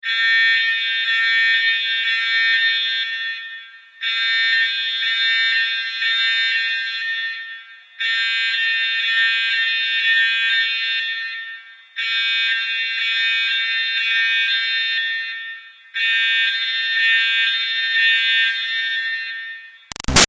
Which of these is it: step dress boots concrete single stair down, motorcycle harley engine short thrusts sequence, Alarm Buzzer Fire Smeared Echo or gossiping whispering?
Alarm Buzzer Fire Smeared Echo